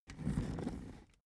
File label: office chair trundle slow short